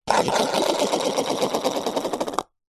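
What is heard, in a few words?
sport golf ball knock into hole cup spin to stop; zapsplat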